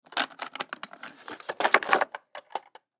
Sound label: Makeup Kit Professional Open; Primping; Human; Blastwave; Foley